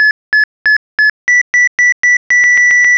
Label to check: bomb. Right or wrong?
Right